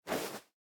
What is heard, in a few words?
Clothes; Smite; Susano